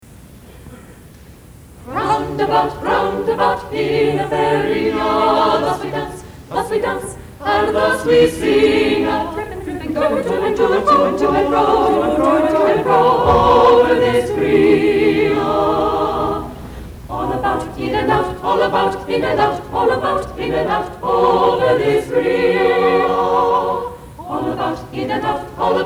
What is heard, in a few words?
Singers Roundabout; Renaissance